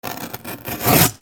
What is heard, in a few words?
RIPPING